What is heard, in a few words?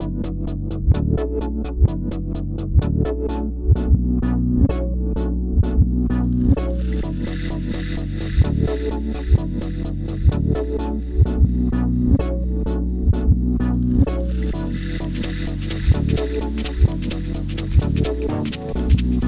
uk funky